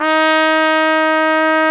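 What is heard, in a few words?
Solo Brass trumpet; AKG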